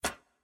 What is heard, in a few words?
addpoint; jade; masteries